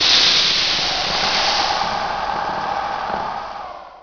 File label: steam burst, ambience; gearbox